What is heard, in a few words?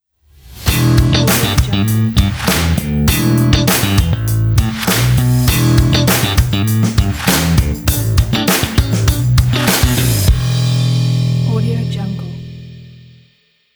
funky slap stinger logo